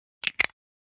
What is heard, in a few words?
camera lens cap; NCH Library